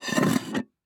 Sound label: Dinner Plate Large; EatingCinematic; HauntedBloodlines; SFX Producer